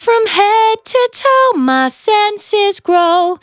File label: Finally 70bpm C, Velvet Vocal; Zero-G